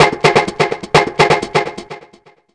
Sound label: 127 sound 1 L, Fresh Disco House